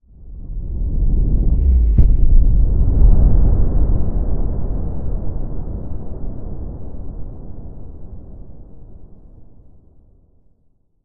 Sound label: Explosion Crash Shockwave; War Ambience; Prydwen